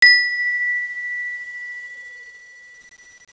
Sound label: chime